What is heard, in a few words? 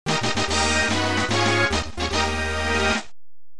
fanfare